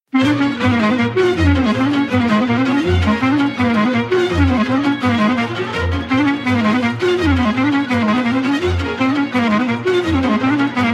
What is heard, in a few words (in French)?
Batuta, Roumanie